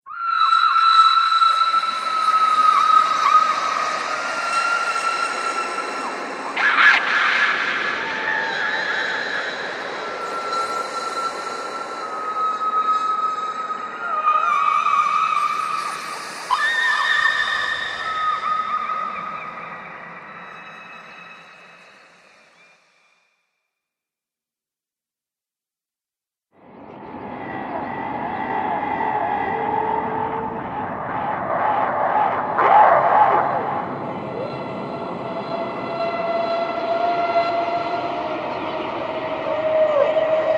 Screams Horror Atmosphere Soundpack, scary soundscape